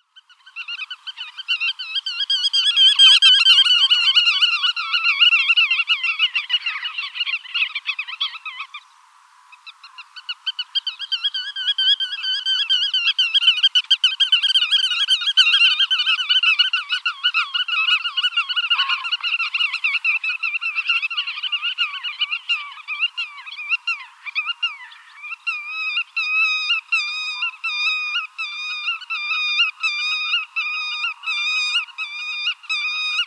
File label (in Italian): Pittima Reale